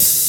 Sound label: HH, HIHAT HIP; Best Service Dance Mega Drums